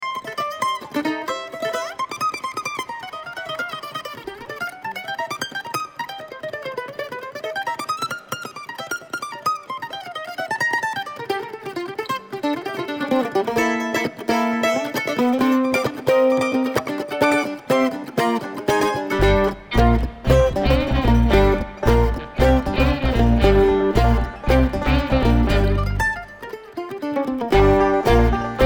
Kentucky Mandolin

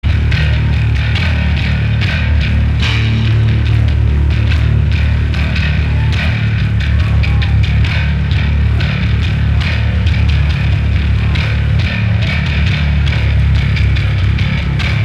Bass Solo

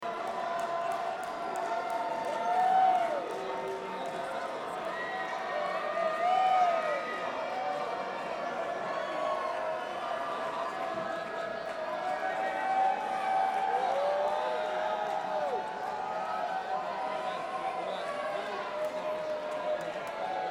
Crowd

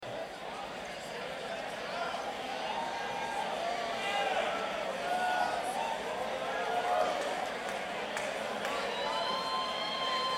Crowd

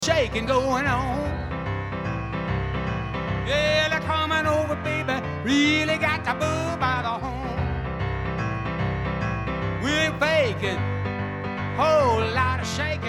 Piano Moment

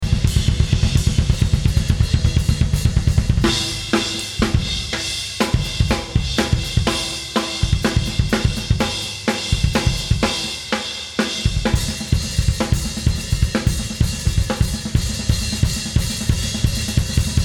Drums

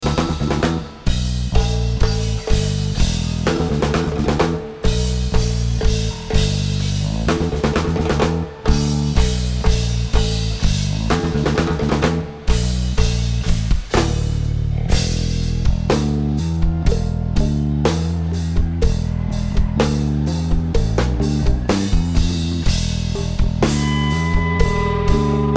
Drums Jam

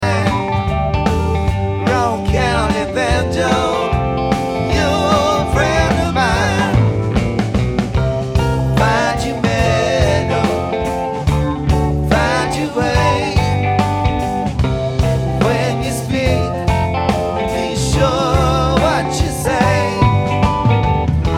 Banjo

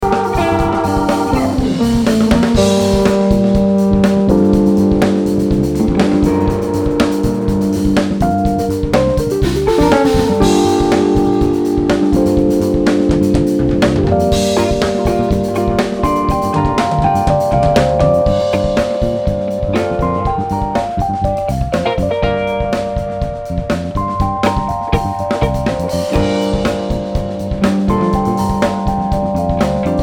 Soundcheck Improvisation

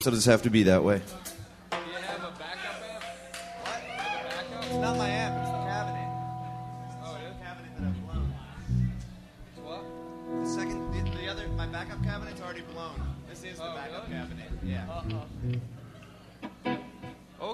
Tuning

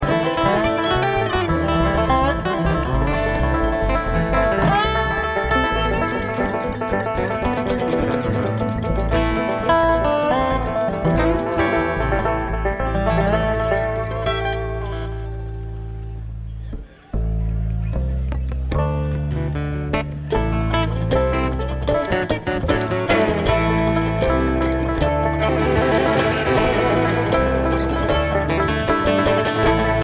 Traffic Jam

